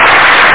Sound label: CLAPPIN; Amiga 8-bit Sampled Voice